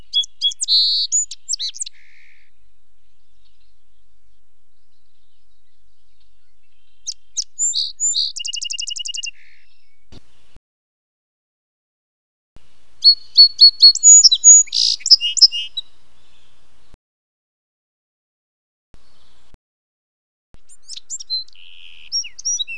song sparrow vs. Bewick's wren

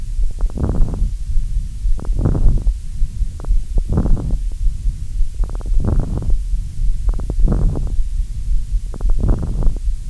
Coarse Crackle

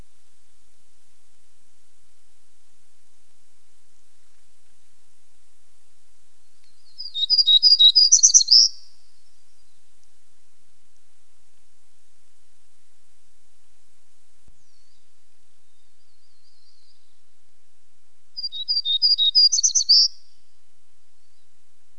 hermit warbler

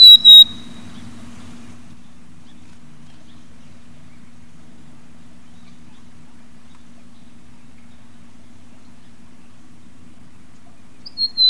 bc chickadee oregon

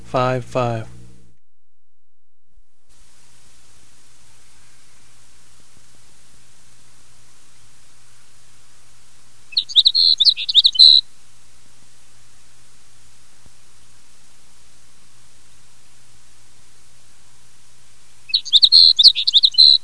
sage sparrow, bird songs of WA calls

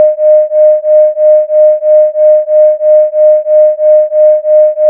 beating

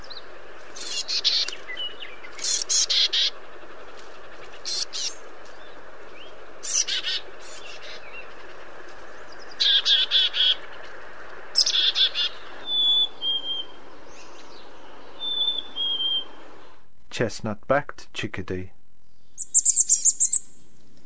Black capped chickadee

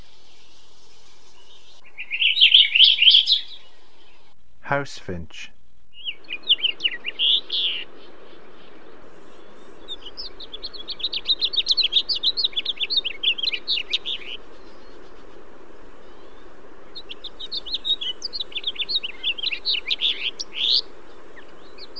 Purple finch vs. house finch

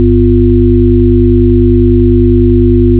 sine

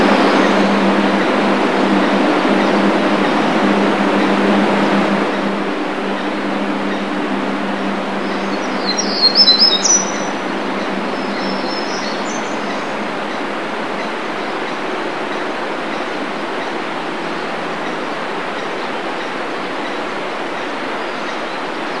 Black throated gray warbler, calls

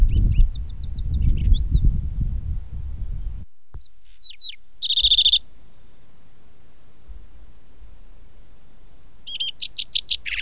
lark sparrow; bird songs of WA; calls

